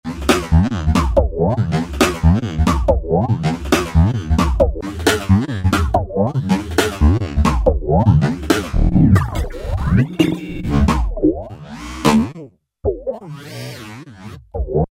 Roland V-Synth; Rubbadub; Factory Patches

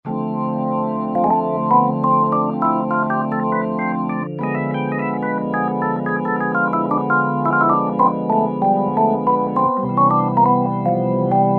B3 Jazz Split; '60s & '70s; Roland SR-JV80-08

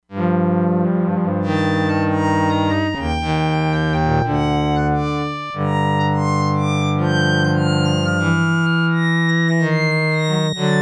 SOLO TO ENSEMBLE STRINGS - STRINGS, Crumar Bit 99; Factory Programs

